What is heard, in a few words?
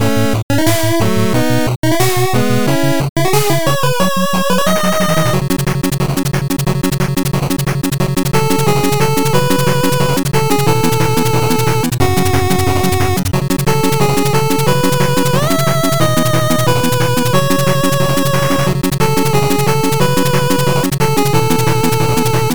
castle stage bpm180, 8bit music